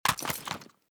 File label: mp5 draw, weapons; Mask's Reanimated Anomaly Arsenal